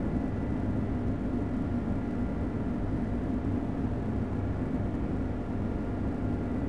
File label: amb large Mine; Star Wars Obi Wan; XBOX